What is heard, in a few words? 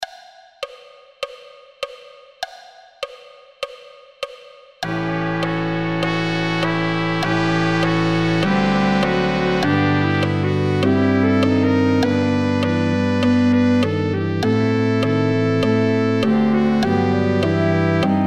no perc